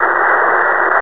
Noise; alone in the dark 4

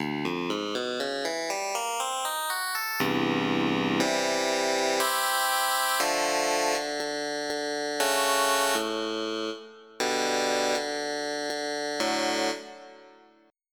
Clavinet; EverQuest

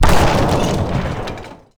oil impact; Gamestar

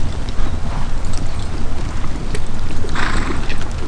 horse

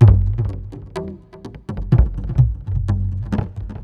PERCLOOP ETHNO 125; eJay

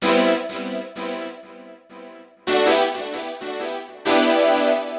Synt; SMOOTH 02 B

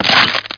crash; yoda

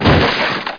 BARRICAD; mtmtrial

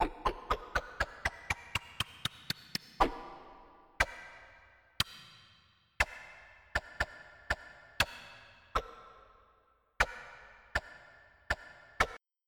sword; Populous Demo

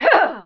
jump; PC Gamer IT CD 41; Daikatana; mikiko

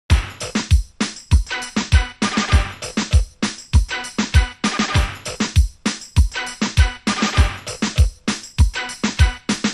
loop reggae; WMBonusXP

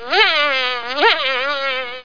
flying away; How Many Bugs in a Box

